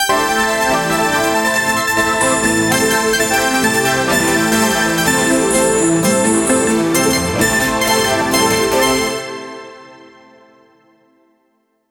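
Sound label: triumphant casino win music; exciting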